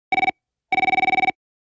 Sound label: ring